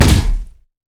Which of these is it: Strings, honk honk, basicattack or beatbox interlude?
basicattack